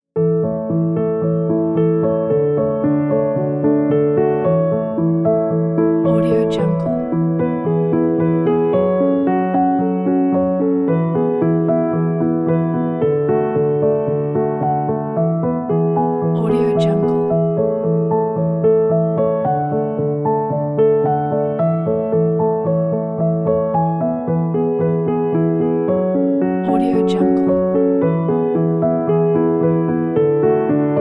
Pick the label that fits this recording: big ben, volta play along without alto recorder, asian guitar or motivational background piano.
motivational background piano